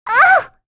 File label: Damage, Vox; Annette; kings raid; en